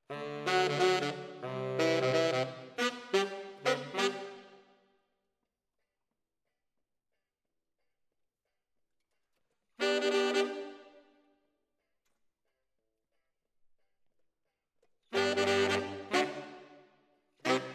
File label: ORTF SCHOEPS, piano